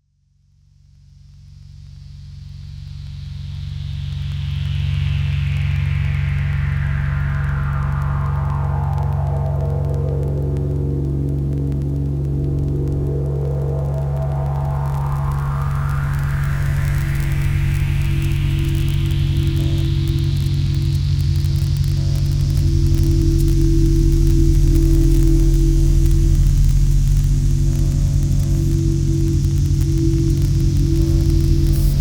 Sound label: various drones